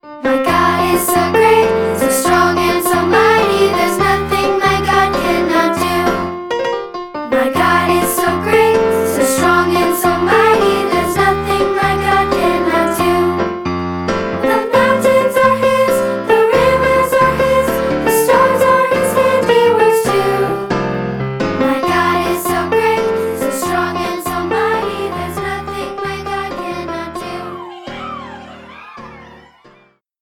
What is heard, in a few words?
Piano